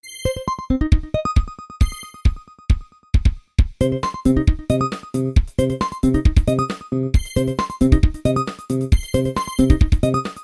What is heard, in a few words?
Nokia 3510 - Disco